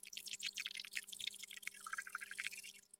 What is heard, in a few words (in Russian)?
nanosim na koju vlajnoe razmazyivanie